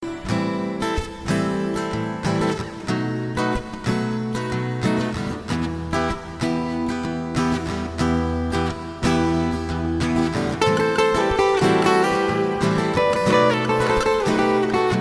acoustic guitar

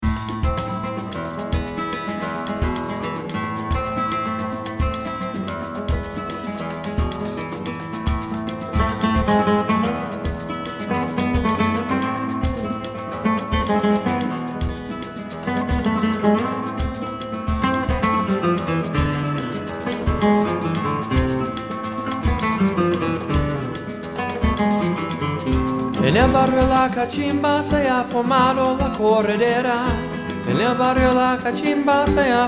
guitar

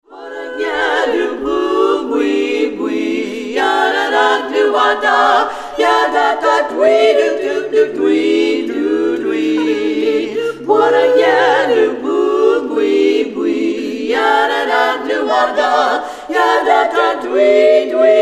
a cappella group

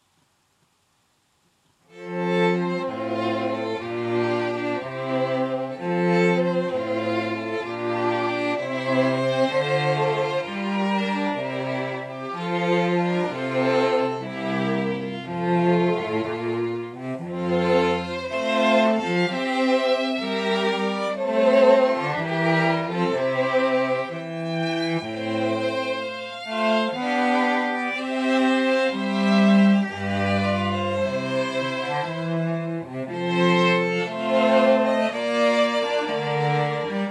Air, Quartet; String Quartet; Handels Water Music

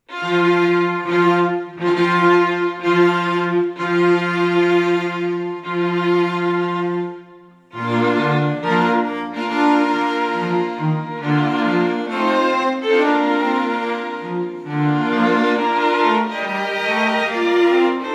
Wagner Wedding March, string quartet; Eloquence String Quartet

